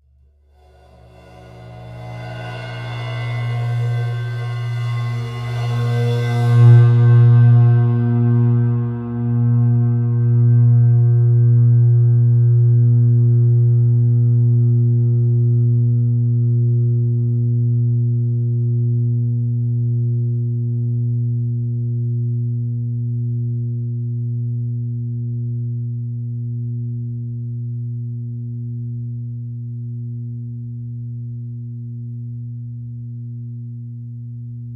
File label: Ride Cymbal Bowed